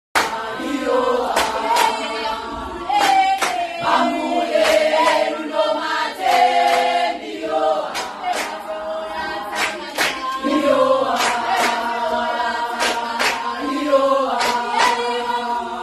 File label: ACAPELLA SOUTH AFRICA